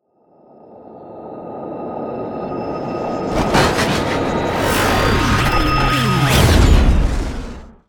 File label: Stinger